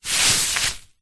foley sleep mask slide on or off over eyes

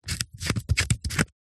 foley binoculars zoom dial turns

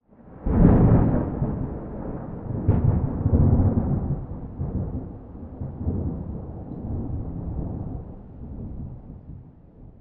nature thunder

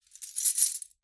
Keys Handling

